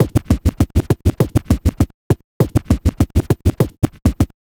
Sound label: Vinyl Scratch Kick